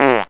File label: fart